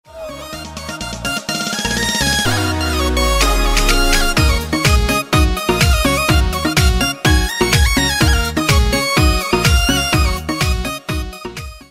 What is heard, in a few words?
Haryana